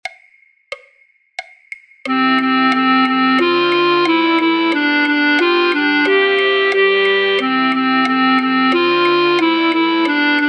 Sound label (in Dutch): Branle flute choir altfluit; voor-oefentrack; Rameau; Platee